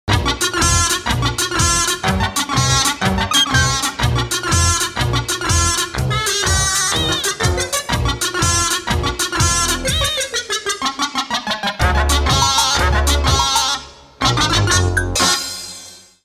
Comic jingle; Jingles medley

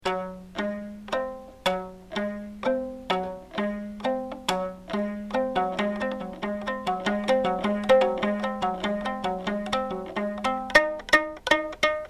elasticophone

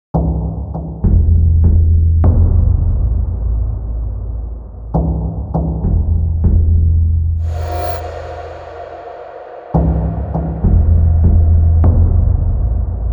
Suspense jingle; Jingles medley

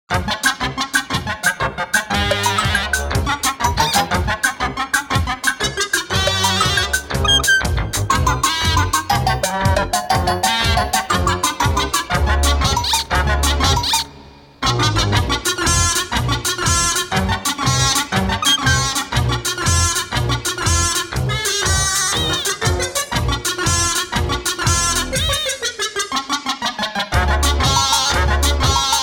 Comic jingle; Jingles medley

